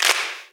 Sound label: Clap; Vengeance Samples